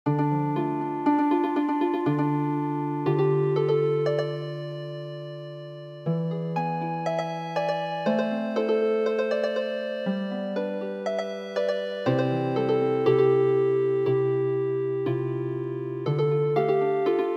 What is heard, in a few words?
Healing Harp, midi traditional